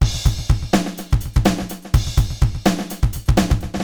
80s delay Akai XE8 (125BPM), cyborg beats

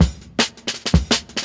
164, Jungle Breaks; 90s Sample CDs; Original Jungle Breaks

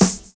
SUB HH; Univers Sons - Kitch 70s

